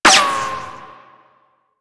Sniper Ricochet; DE; Warframe; Warframes; Frumentarius; ExaltedSniper

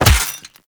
Melee Fist Impact Robotic Flesh

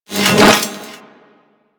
Projectile Whizz By; Enemies; Abilities; Sentient Brachiolyst

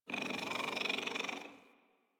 Puppy Interact Affection Closed Mouth; Enemies; Kubrow; Warframe

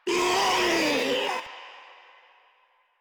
Infested Ancient Vocal Attack; Warframe; Ancient Ninety Nine; Proto Infested Ancient

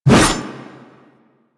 Reaper Melee Whoosh; Warframe; Wraith

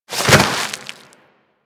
Roll Dirt; characters; generic foley; Warframe

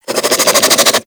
Infested Crawler Attack; Warframe

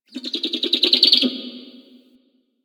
Owl Critters Perched Ground; Warframe; Ambience; Duviri

